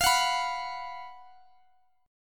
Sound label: B 5th F, chord; B5/F Chord {X X X 22 19 19} strummed